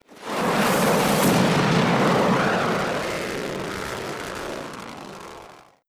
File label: nuke launch; SuperPower 2 Redux V7A